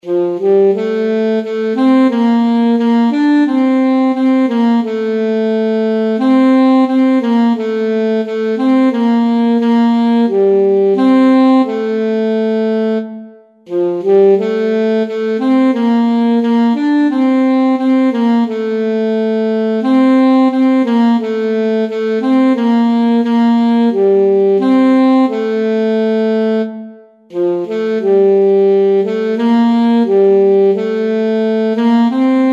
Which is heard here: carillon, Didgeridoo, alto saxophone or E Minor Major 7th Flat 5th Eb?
alto saxophone